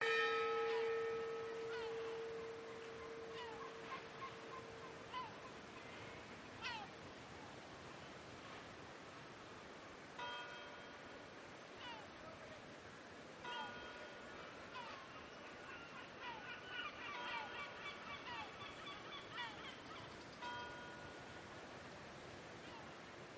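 coastal spain quiet park wind church bells distant traffic hill seagulls sparrows; pmsfx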